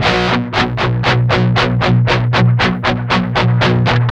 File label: 8TH C 3, Guitars; Spectrasonics; Hans Zimmer Guitars VOL-1; 128-JACKHAMR